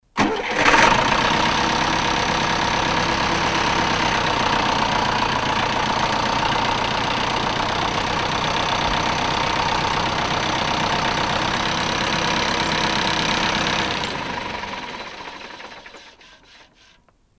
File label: middle-aged tractor starts moves and stops